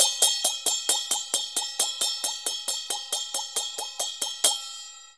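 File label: China cymbal; Spectrasonics - Heart of Asia CD2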